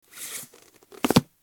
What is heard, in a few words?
book; ハードカバー本を積み重ねる音（単発）